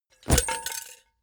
leather metal bodydrop

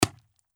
impact squeeky

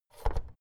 oven knob press out hard

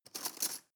cutlery teaspoon drawer grab

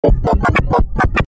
short neuronal pulses

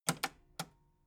metal button cassette rewind slow

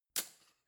cutlery spoon drawer place

